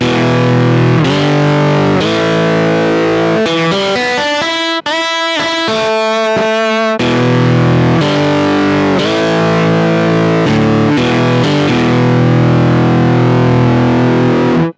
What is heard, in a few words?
E Guitar Fulltone GT500